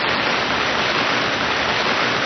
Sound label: heavy rain